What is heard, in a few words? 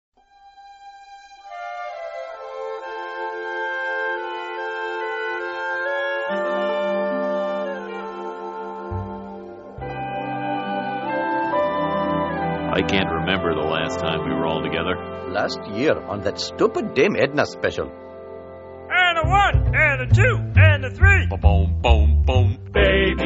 barbershop quartet medley; homers